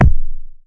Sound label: Yamaha TX-81Z BD